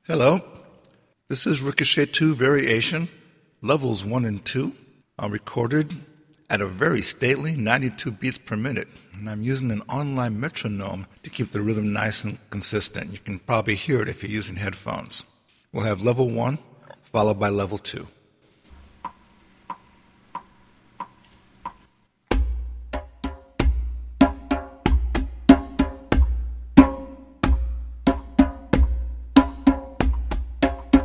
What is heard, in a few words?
Ricochet 92bpm